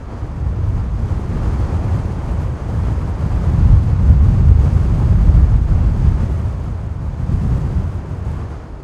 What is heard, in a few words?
Wind Medium; 1944 Battle Of The Bulge